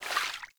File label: Alchemist Vial Foley D; Warframe; Warframes